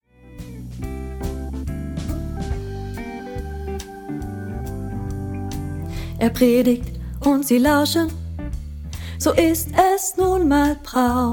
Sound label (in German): Lern-Sopran